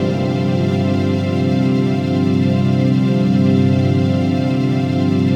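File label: trance pad; Best Service; XX Large - Pads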